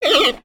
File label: Pets Bear Panda Happy; creatures; Lego